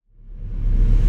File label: Reverse Impact; Cymatics; Orchid Sample Pack